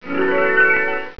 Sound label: Harp, ascending then decending notes (smooth, flowing, like scrolling through a list)